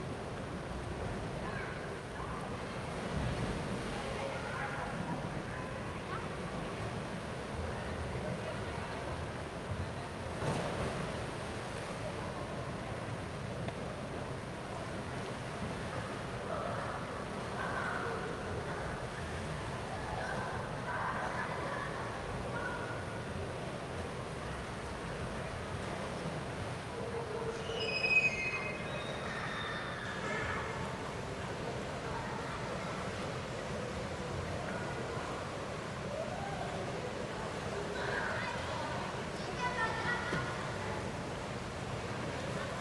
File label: ambience indoor swimming pool; sonniss gdc